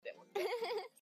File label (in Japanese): 笑い